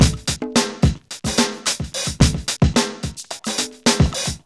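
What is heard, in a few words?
109 bpm, Funky loops; Zero-G; Funky Elements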